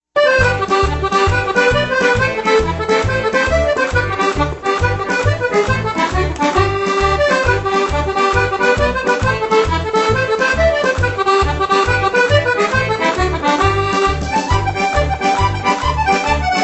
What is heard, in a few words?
song